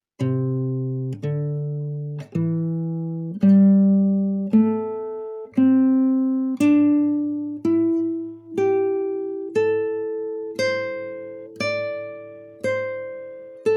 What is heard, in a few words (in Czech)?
Pentatonika